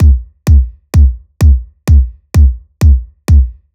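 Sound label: Kick Loop 128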